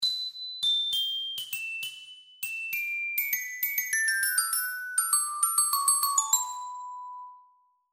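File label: descending glockenspiel; Contour recognition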